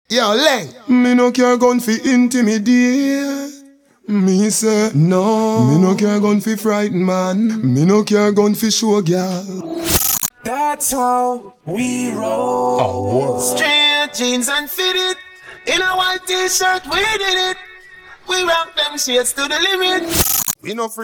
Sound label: acapella